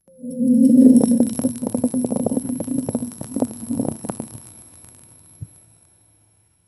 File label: soft rustling sound of ve, velvet